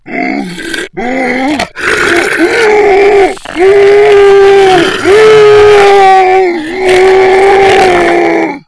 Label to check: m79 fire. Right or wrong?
Wrong